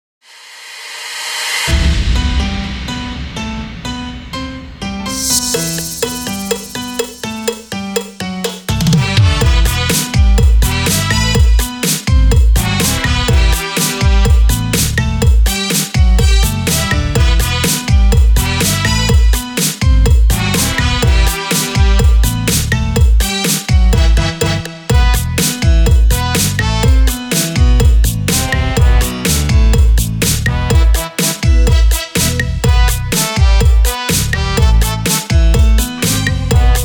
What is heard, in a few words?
cha-cha-cha